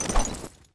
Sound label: knife slash, weapons; svencoop; ww2projekt